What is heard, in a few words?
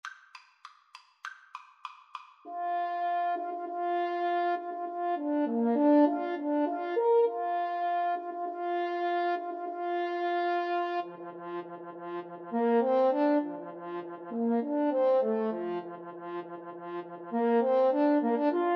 French Horn Duet; rossini william tell